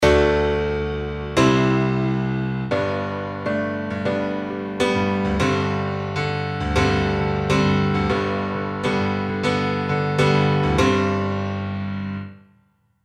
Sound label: piano